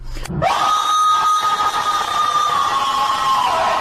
scream ahh; skinwalker

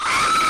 loud scream

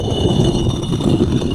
moving stone

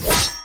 sword swing hit

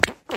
the super fart

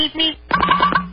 beep beep

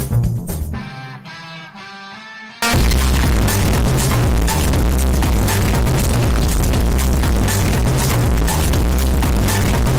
bass boosted; subway surfers

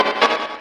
battle start jingle; deltarune

